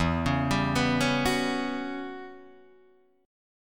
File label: E Minor 6th Add 9th, Guitar, Drop D; chord shape